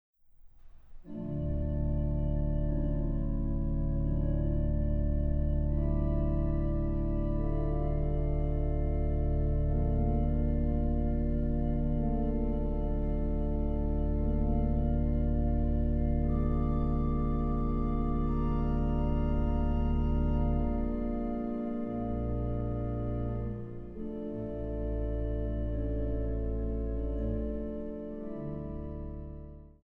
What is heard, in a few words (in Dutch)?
orgelkoraal psalm 126 vers 3